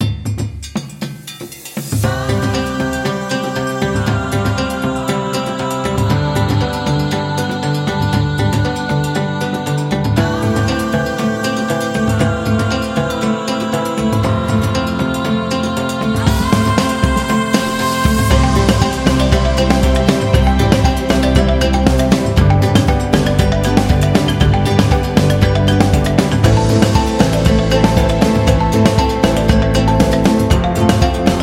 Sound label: Happy Africa